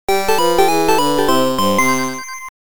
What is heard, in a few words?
Game Over Music; Dig Dug II; arcade